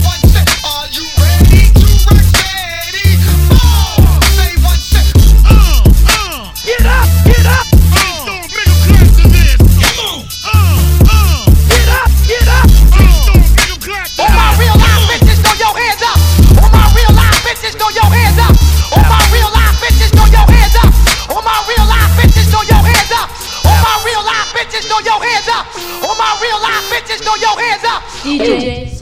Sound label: Nokia Dj Saleh Mix